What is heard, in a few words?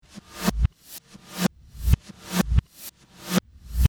reverse